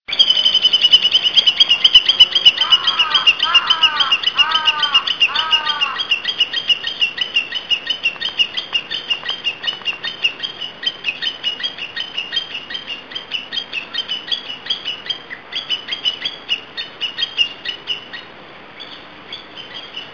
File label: Japanese nightingale note